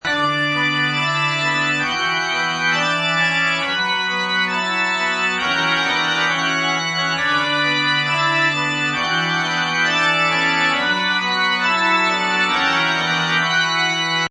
Horr Pipe Org Bm, Pipe organ horror loop in B minor at 135 bpm.; Keyboard Loops